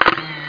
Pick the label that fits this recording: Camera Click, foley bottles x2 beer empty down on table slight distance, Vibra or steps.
Camera Click